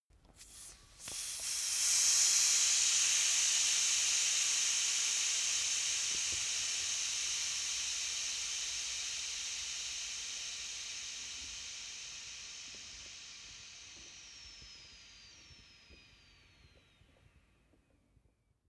soccer air release

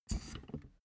office chair hydraulics down stepwise

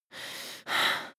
breath female active aroused

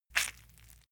leather impact mud soft squeeze

